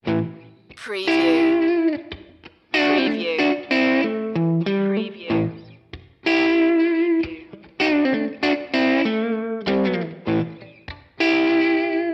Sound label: sad guitar sting